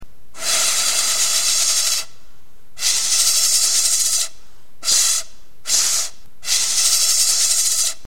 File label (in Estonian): kõrrepill